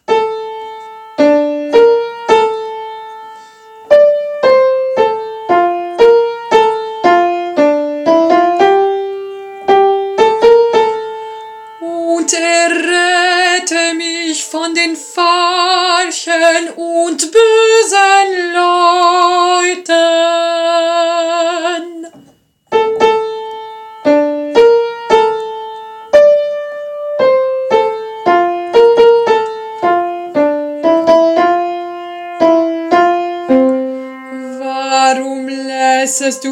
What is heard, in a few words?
alto